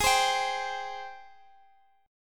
G sharp Major 7th G sharp, Guitar Drop D

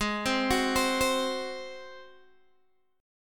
G sharp 7th G sharp, Guitar DADGAD

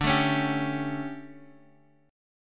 D Major 7th Suspended 4th Guitar DADGAD, chord

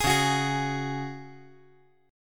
Db Suspended 4th Db; strummed

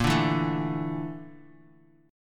A sharp Major 7th Flat 5th A sharp Bass Standard; A#M7b5; strummed; JGuitar

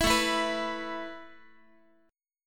A Suspended 2nd A Guitar, chord; Asus2; strummed; 4A,4E,5A,5D,5G#,6C#; JGuitar

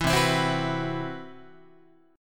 C Major Sharp 11th, Guitar; CM#11 strummed; JGuitar

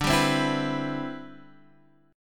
D 13th Suspended 4th D; D13sus4 strummed; JGuitar

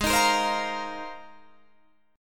G sharp 6th Add 9th, Guitar, Open D

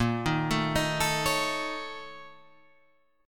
A sharp Minor Major 7th Double Flat 5th A sharp

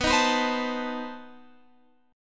B 7th Flat 9th B Guitar Open F; B7b9; strummed

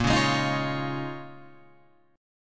A sharp Minor 11th, Guitar, Open F; strummed; JGuitar

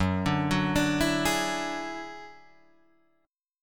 Gb 7th Suspended 2nd Sharp 5th, Guitar, Drop D

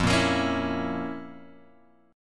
F Major 7th Suspended 4th Sharp 5th F; FM7sus4#5 Chord {1 1 X 3 2 0} strummed

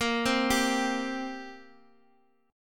G Minor Double Flat 5th A sharp

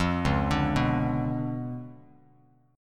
C sharp 7th Flat 9th Bass Standard, chord; C#7b9; arpeggiated; JGuitar

